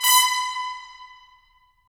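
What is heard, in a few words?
brass trumpets staccato; Roland - LA Composer vol. 4; 90 s Sample CDs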